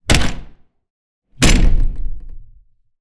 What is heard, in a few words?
Angry Door Wooden Entrance Open Close Slam Hard